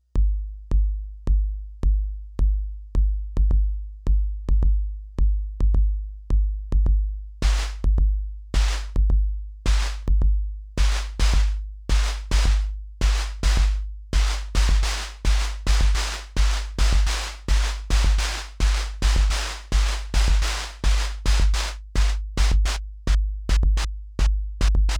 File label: snare fm; radioace; By Mike Walters - Chapel Hill, North Carolina